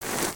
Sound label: Footstep; Lego; Strombie; creatures organic